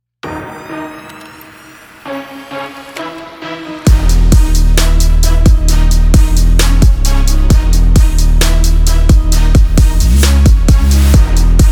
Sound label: Trap